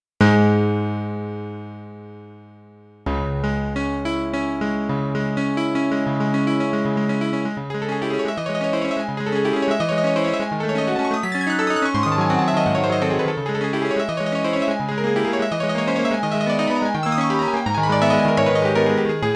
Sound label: Piano